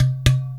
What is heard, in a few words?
INDIAN DR MD6, INDIAN PERC; Spectrasonics - Heart of Asia CD2